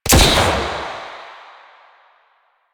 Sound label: Shot A, Weapons, Sidearm; Warframe; Tenno; Wraith Sidearm; Epitaph Prime